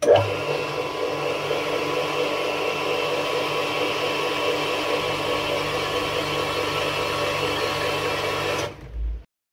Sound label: pump; Fire